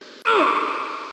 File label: punch